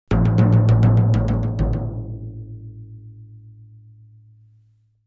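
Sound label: Drums Toms C Low Accent, drums tom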